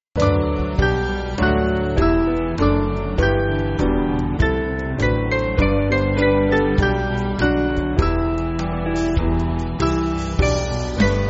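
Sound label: Band, Backing Files for Churches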